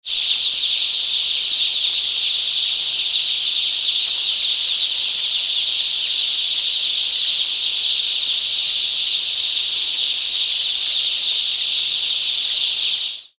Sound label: 20000 chicks going cheep